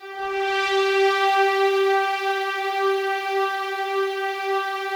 BIG SLOW G4, BIG SLOW PAD, Yamaha VP-1; Music Store; 90's Sample CDs

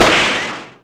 gun, Sound Effects

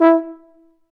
F TUBA, stacc; Roland L-CDX-03

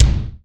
BD ROOM, DRUMS; RY30DRUMS; East West; Global Sounds

